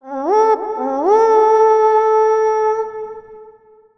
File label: horn; Buff banner; blue